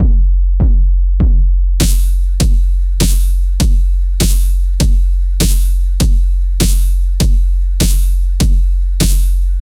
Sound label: drum sound for level up, rhythm